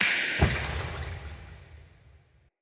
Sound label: Floppet Snap; Cinematics; Bromius; Bromius Quest Wake Up Poppet